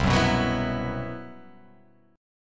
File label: C Major 7th Flat 5th C